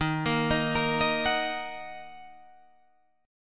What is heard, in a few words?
Eb Minor, Guitar, Drop D